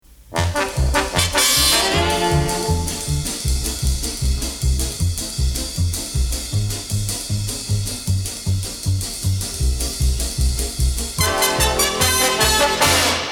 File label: Instrumental; Something Exciting; CKWX; 1961; Anstey Collection